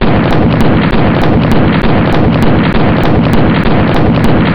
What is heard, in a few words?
sabre gun